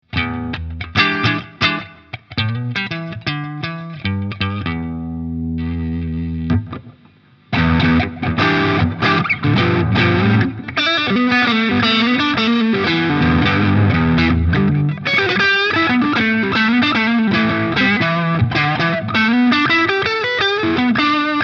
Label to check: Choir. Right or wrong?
Wrong